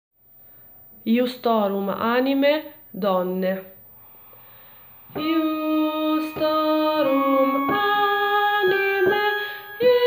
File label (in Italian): donne; Justorum animae